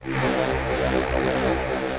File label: Pitch Me Down, Brooding Bass; Instruments; Ableton; Mood Reel